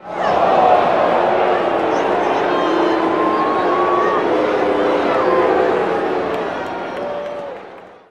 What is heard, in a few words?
BMX Crowd Boo Medium; Crowds